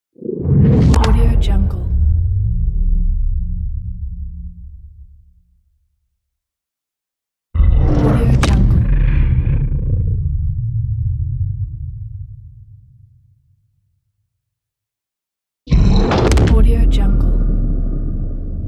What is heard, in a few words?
cinematic impact